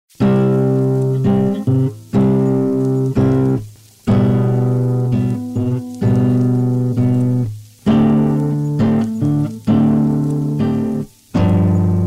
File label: Worn Tape; EFX Motions